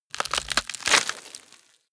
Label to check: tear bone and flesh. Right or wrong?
Right